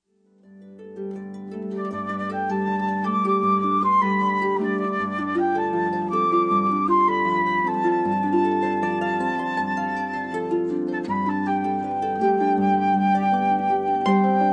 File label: Harp Flute Cello